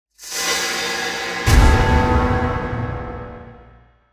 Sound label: fail